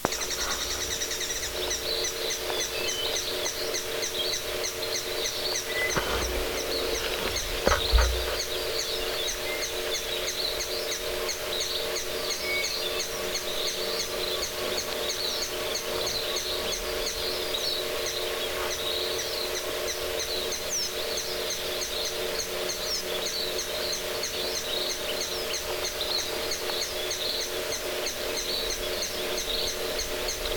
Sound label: volcano hummingbird